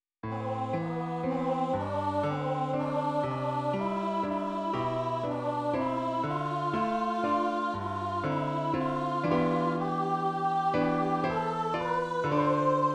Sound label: memorial acclamation piano no priest